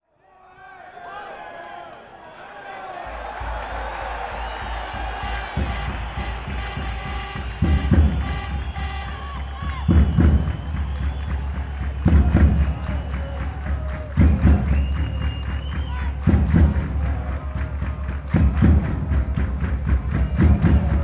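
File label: football fan drums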